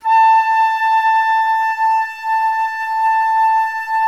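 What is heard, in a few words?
flute legato forte A4, Orchestra; Best Service; Peter Siedlaczek's Advanced Orchestra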